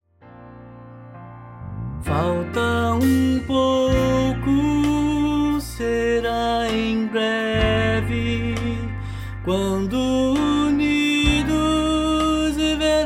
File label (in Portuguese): 2o TENOR